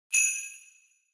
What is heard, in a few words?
Sleigh Bells Dry Knock Hard